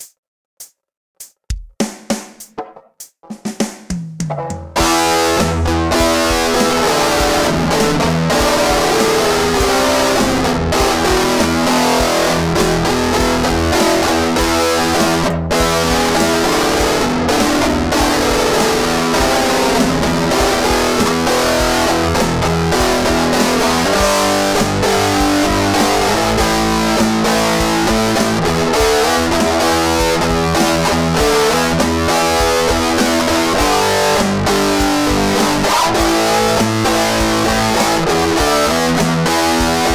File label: 100 BPM E Minor; song in a hat 2025